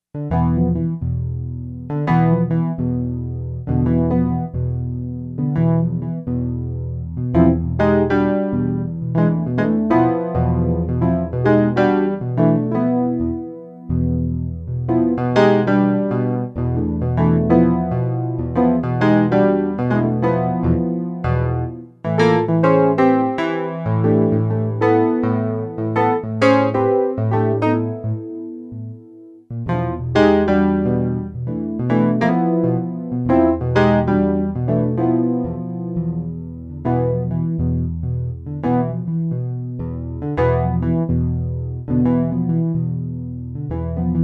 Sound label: jungle line blues